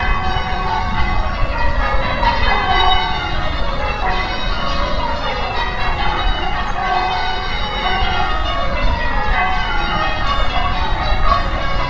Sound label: bells